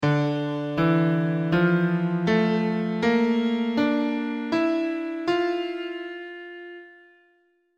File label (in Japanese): ハピドラム; Ｄアケボノ; D3 E3 F3 A3 Bb3 D4 E4 F4